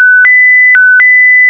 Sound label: Message; Galactic Trek